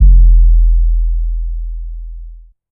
Kick Pump It

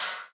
Hi Hat Close

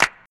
Clap; pitchglitch

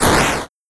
Noise Random SFXR

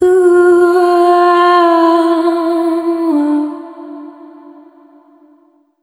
Vocal Uhhha D

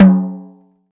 Snare Muffle; BJAPacific

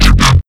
Growl Reese

